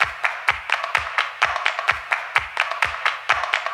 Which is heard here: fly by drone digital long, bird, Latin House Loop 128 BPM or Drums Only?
Latin House Loop 128 BPM